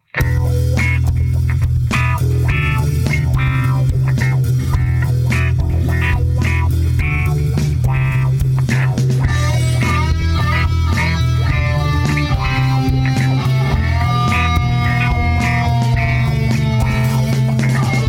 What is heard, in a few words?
Drums